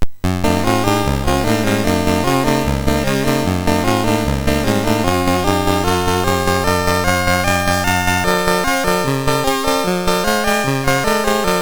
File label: MOS6581R3, GAMES; Willow Pattern; HVSC